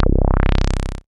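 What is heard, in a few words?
synth analog; Best Service Gigapack 1; SYN 02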